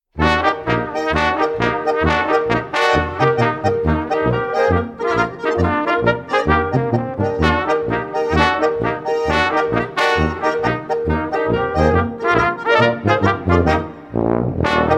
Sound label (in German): Polka